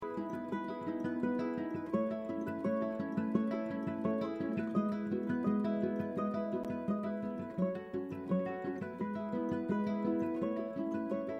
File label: drive to market music; Life is Strange 2; gamesoundopedia